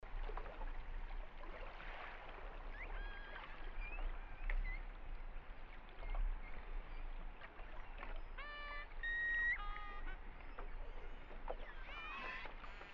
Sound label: attack on gray whale